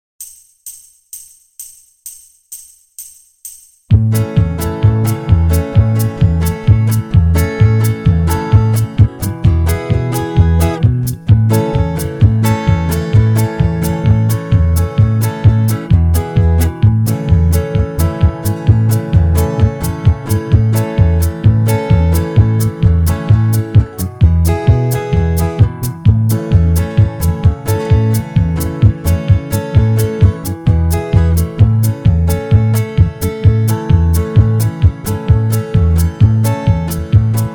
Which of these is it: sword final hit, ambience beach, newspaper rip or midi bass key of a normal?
midi bass key of a normal